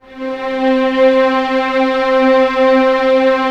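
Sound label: STR C3 FF, Orchestra Strings; Sound On Sound - Peter Siedleczek's Orchestra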